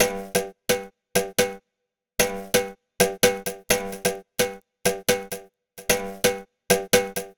BEAT BERIMBAU

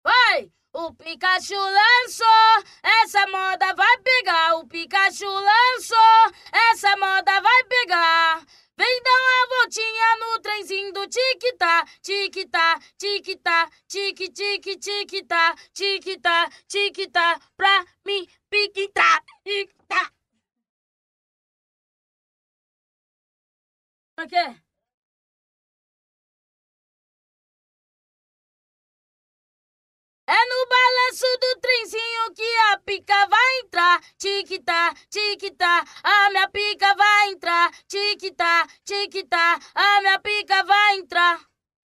ACAPELLAS EXCLUSIVA

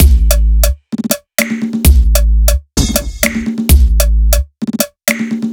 BEAT 4 TEMPOS

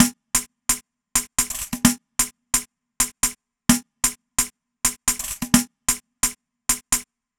Beat Pandeiro; DF KIT

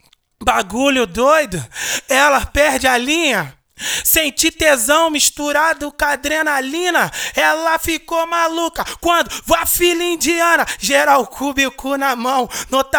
acapella

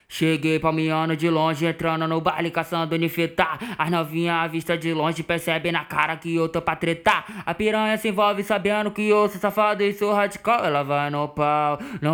ACAPELLA

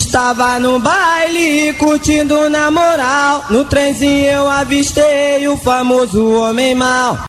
Vinheta

